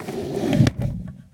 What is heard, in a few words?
door close; Golden Crayon Sounds